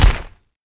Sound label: queen foot; Attack of the Radioactive Thing